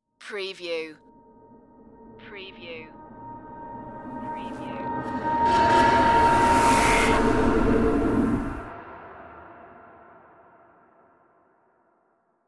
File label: dimension glitch flyby